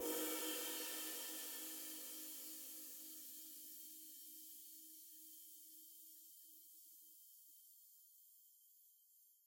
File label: Cymbal; tintin reporter cigars of the pharaoh